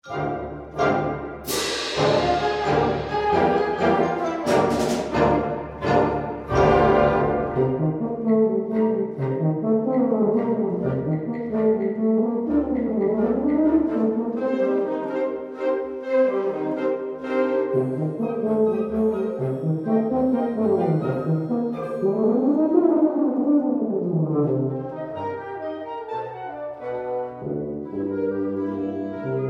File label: Tuba; Suite Tuba